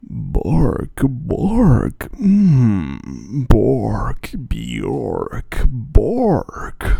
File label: Bark